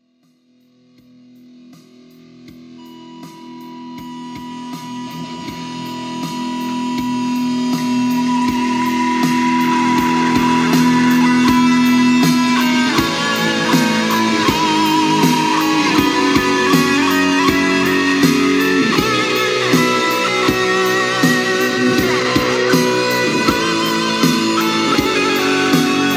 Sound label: Iran national anthem rock version